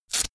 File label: velcro large open fast